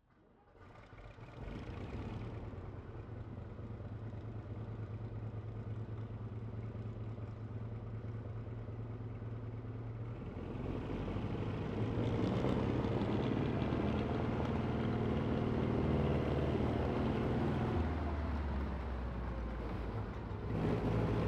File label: SOMUA Tank, Ext, Medium, Up, Away, Reverse; S35 1942; DPA4021